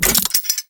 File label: Exalted Archwing Scythe Mech; Weapons; Melee; Warframe